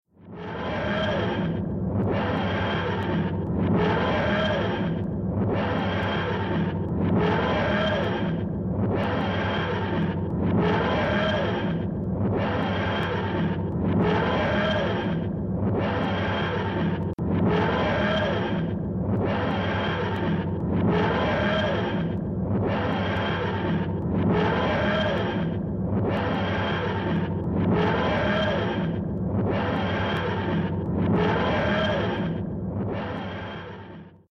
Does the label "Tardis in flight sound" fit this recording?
Yes